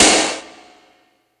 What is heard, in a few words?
trebly steel snare drum sound D# key